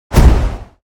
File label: Vampire vanish dark dissolve